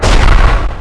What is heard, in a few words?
attack; acidus